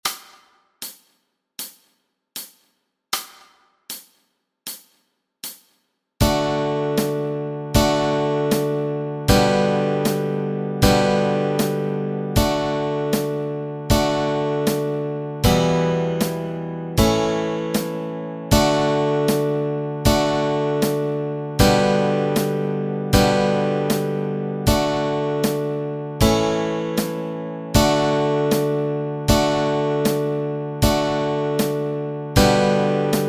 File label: Chords Track, Easy Guitar and Ukulele